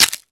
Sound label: Branch Snap; Zelda